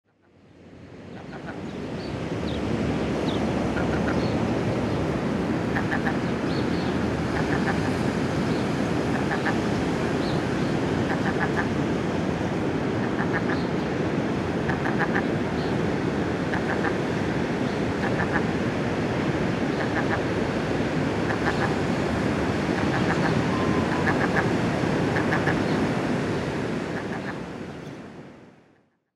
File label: ocean beach with seagulls